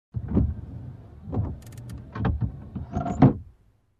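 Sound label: Car Windshield wipers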